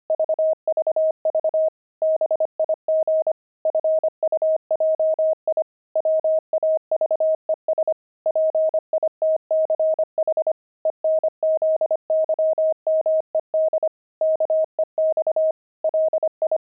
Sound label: pangram, intermediate pangrams files; fuji